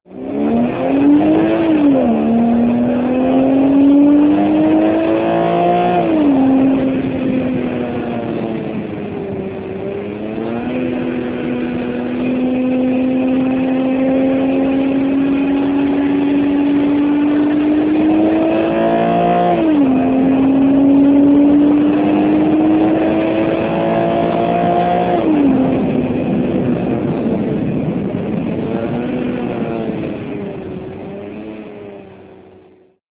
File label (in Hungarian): menet, porsche boxster